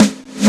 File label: SNARE, DRUMS; Analogon